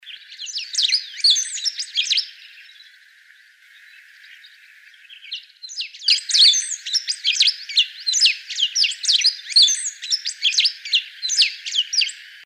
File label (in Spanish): Verdon, Pampa Finch (Embernagra platensis)